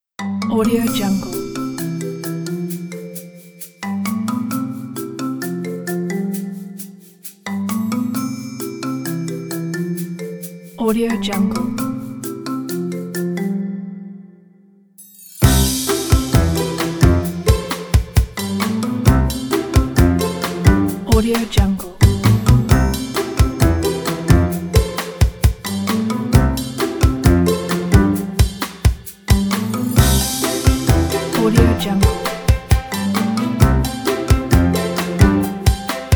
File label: cartoon comedy